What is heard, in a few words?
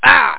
hurt; dosgames; labyrint